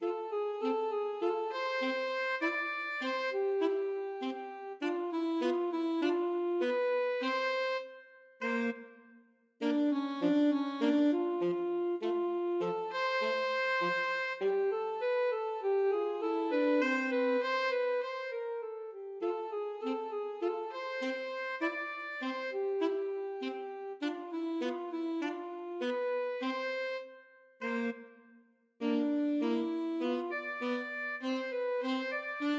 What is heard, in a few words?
rag, alto saxophone duet